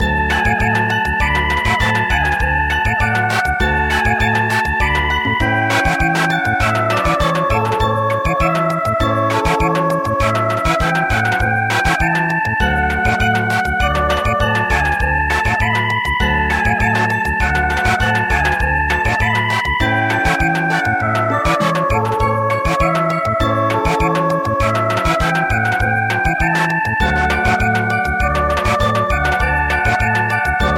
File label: Oberek Samba